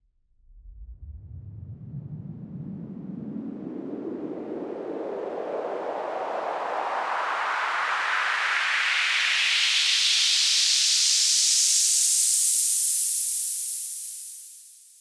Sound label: Raise Clean